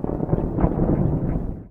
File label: THUND; XXL 800 Production Effects